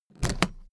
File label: suitcase put down